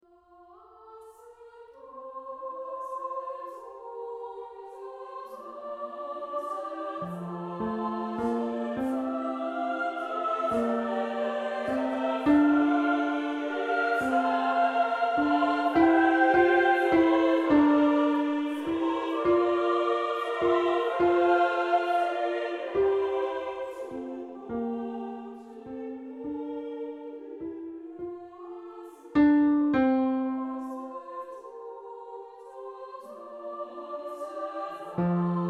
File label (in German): Tenor; Brahms